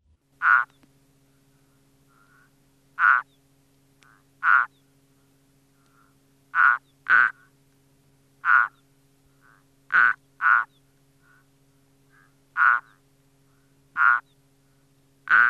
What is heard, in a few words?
Frog Turtle